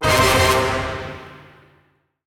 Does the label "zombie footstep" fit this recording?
No